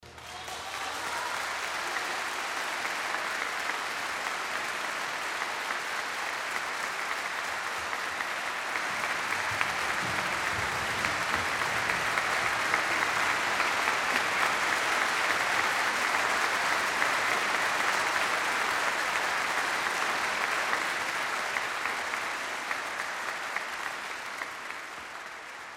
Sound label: Applause